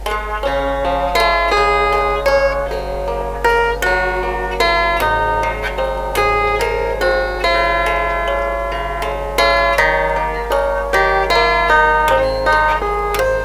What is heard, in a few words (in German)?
Chordephon